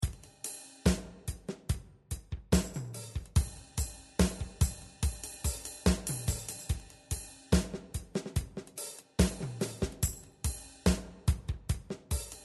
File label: drums